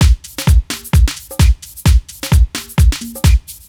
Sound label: Drum Loop 130, Downtown House; Drum Loops 130bpm